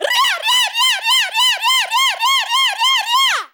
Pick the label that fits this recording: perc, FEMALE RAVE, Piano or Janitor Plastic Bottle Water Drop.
FEMALE RAVE